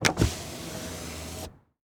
Transportation Car Monte Carlo Exterior Power Window Roll Down Half Way